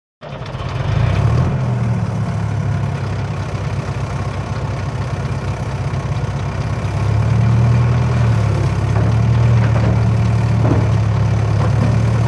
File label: Digger; Mechanical Digger At Work; earthmover